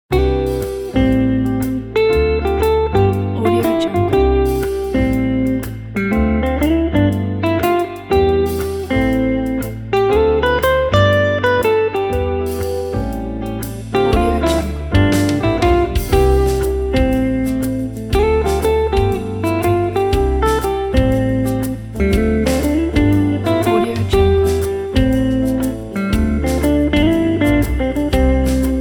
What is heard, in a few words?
happy cafe jazz guitar combo